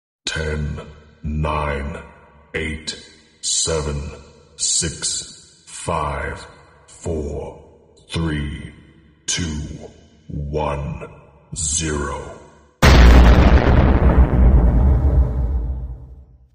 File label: countdown explode; ringtones; sms